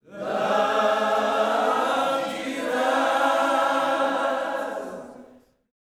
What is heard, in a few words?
LOVE JESUS, Gospel